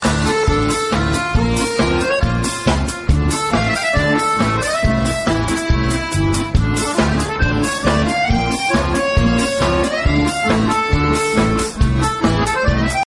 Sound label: iphone3 ring ringtone